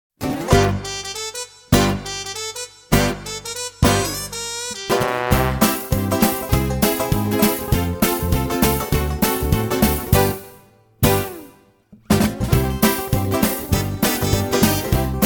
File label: Backing